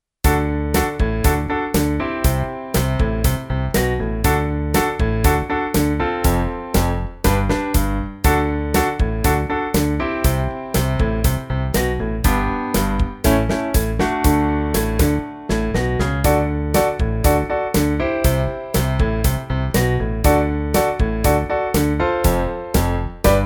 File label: Soulful Rock